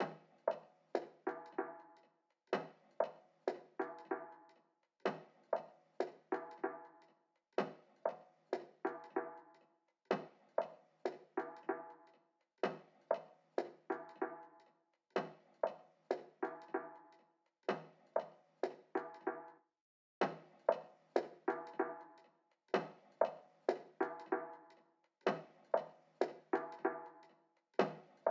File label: Intro - 95 BPM D# Maj Percussion, Hip Hop; Melody Loops; Loop Stems; Cymatics